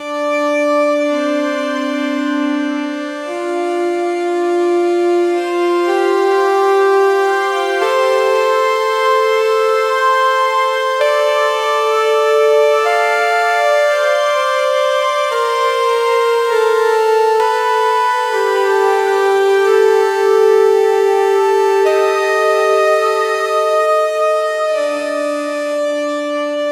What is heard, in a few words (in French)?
synth nostalgique qui jou; melodic